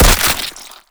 break body; physics; breaking

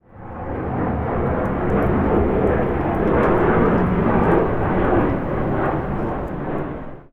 airplane, overhead; ambient; bank; Counter Strike Model Pack; CS:GO

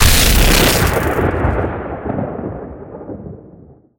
shockwave projectile end, substation; mechanical units; Half Life Alyx

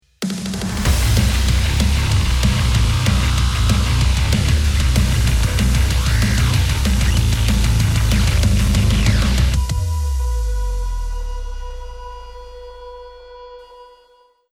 round ten sec count, music

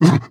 breath short, zombie

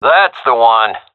cheer, seal diver; vo; agents; Source Model Packs; Counter Strike Model Pack; CS2

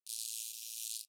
substation oneshot; nuke

